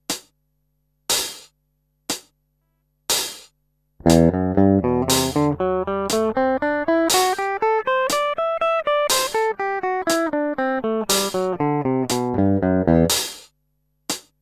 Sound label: finger hard